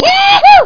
yahoo

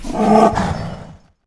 troll

